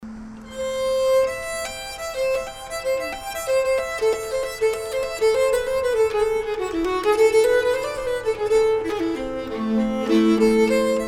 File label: nyckelharpa tune